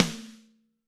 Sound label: Snare Crack